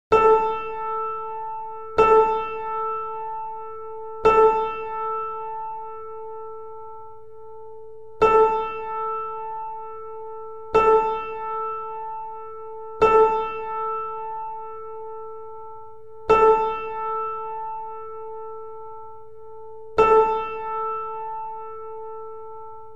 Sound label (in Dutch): Stemtonen